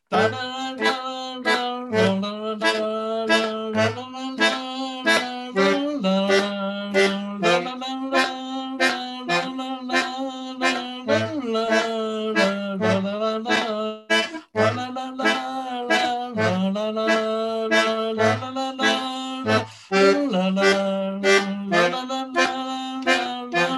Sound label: Rythmic